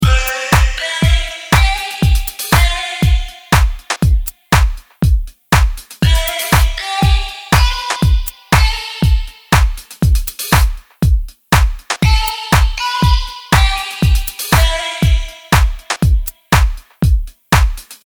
melody

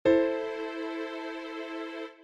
Am 2nd Inversion

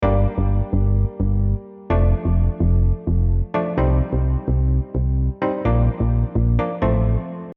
Chords Not so simple Bassline

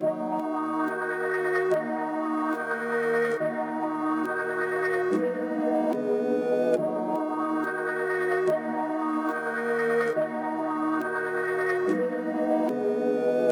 Type Beat Melody Example

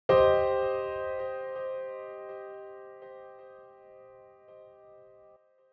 C Minor 2nd Inversion

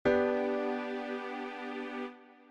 G 1st Inversion

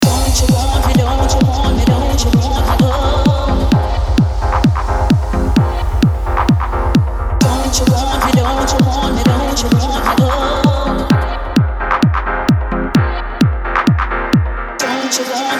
Vocal Hook

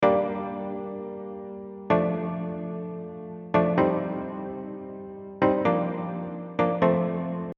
Chords G minor

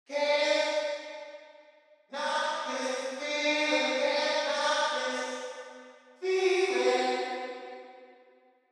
Insert Reverb

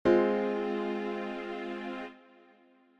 Fm 1st Inversion

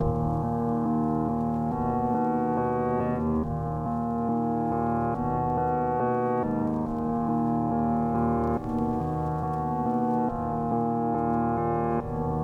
Type Beat Melody Example

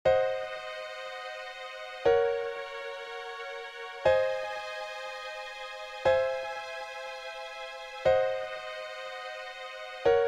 Chord Progression I V vi IV D Super Locrian

